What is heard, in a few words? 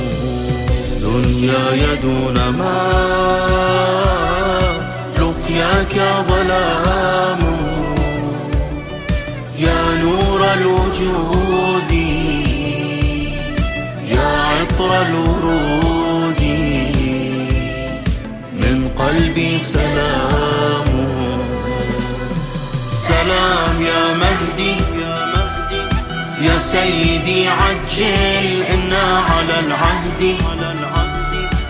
Arabic, ringtone religious